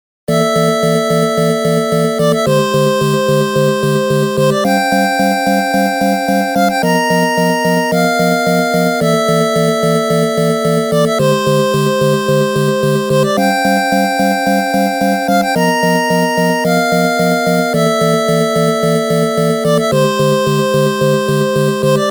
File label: bgm 8bit; maou